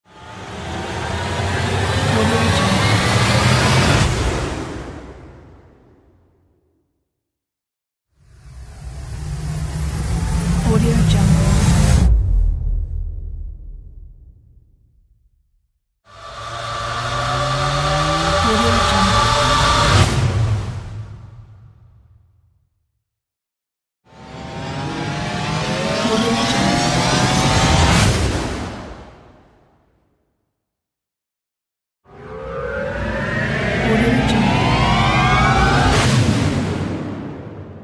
risers; fland

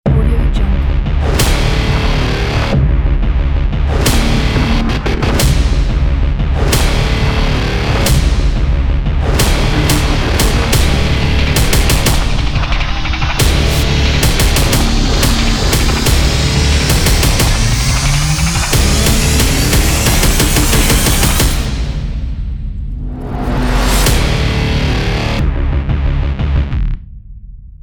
epic action trailer teaser

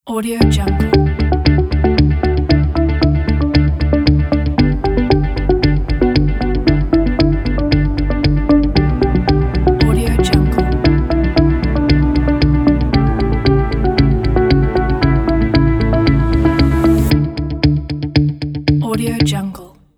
success ident; crazytunes

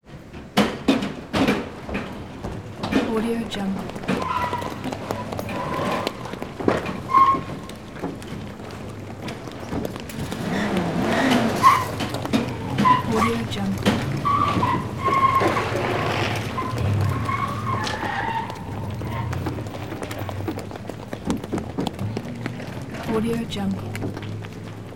panic running footsteps

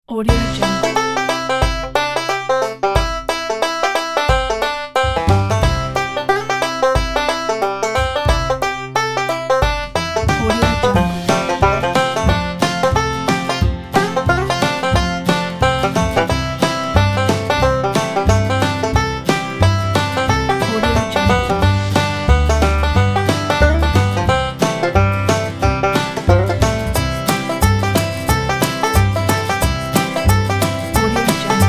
banjo; banjo man

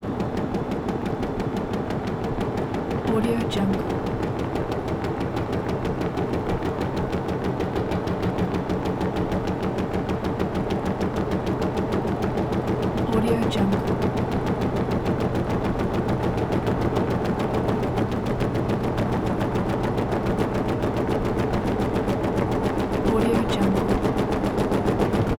bus constant; prosoundfx

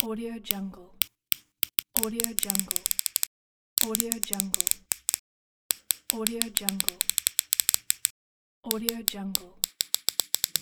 safe dial rotating click pack

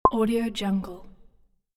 navigate button

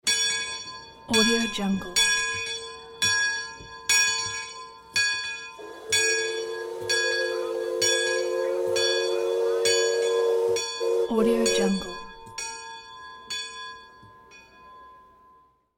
paddle wheel boat with bell whistle; hollywoodedge

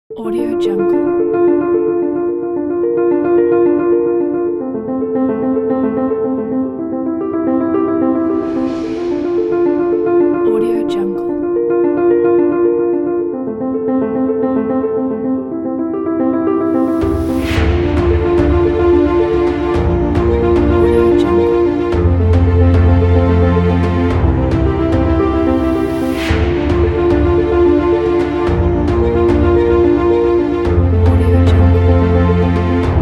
epic cinematic pack

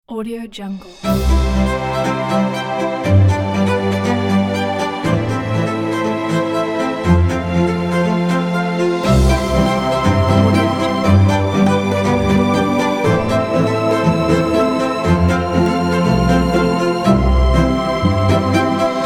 classical logo

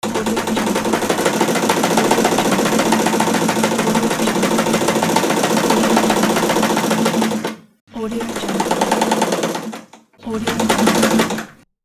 machine; tematix

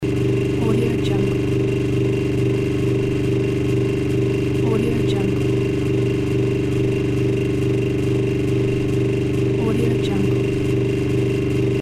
old refrigerator loop

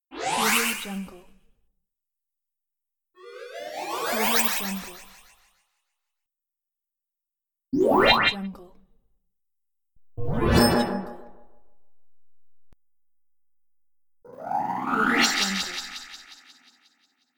vanished game disappear; livingroomclassic

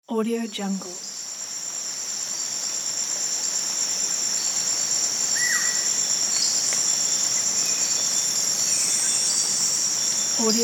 jungle ambience with light rain

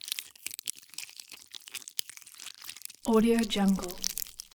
splatter splat; soundbits-soundfx

